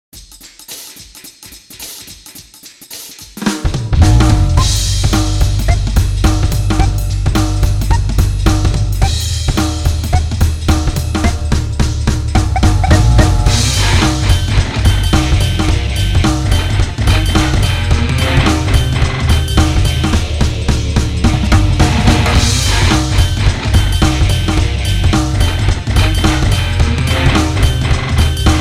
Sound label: noise